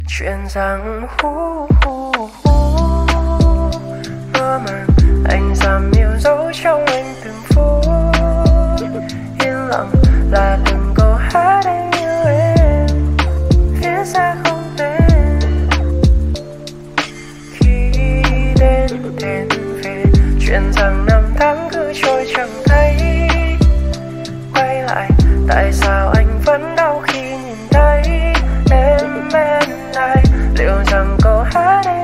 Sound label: Lofi Ver.